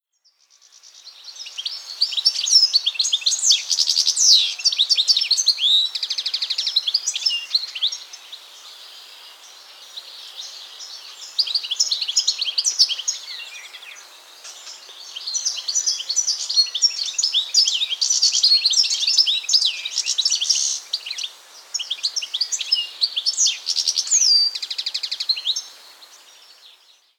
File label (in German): Stieglitz